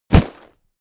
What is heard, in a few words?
sand bag drop